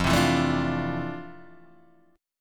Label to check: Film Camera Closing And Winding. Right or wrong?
Wrong